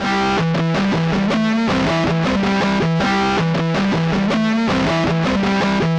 Super Slink Gtr, Rock, Guitars

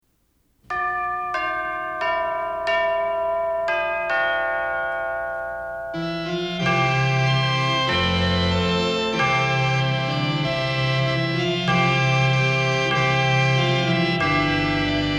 Christmas Organ & Chimes While Shepherds Watched

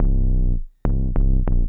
Bass, Breakbeat

